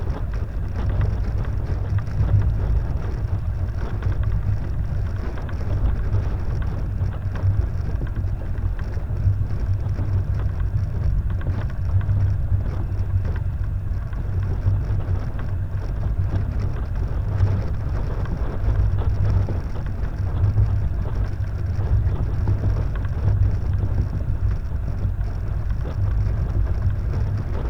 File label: Sailing Noise Only